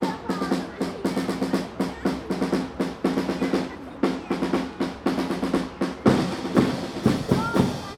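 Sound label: Circus Drums Intro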